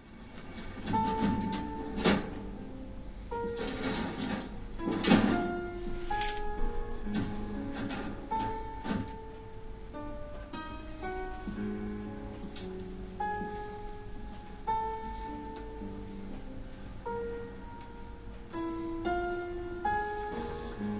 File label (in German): klavier solo; tatort